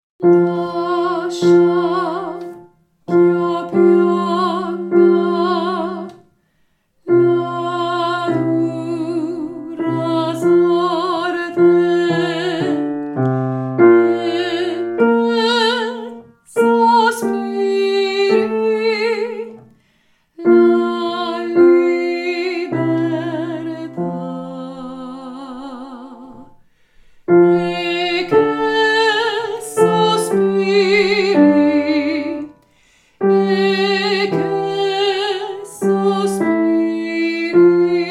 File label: Alto; Lascia ch-io pianga